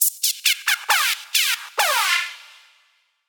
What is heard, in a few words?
Dub Step Trap Siren Loop; Dpoggioli